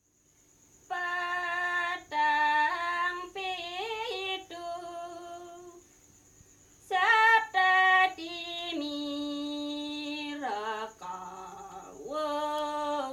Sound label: gending rare; Asak; Cening Ayu; 1972